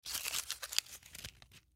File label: Paper Crumple, Paper Crumple Label Wrapper; Materials; Foley; Paper Sound Effects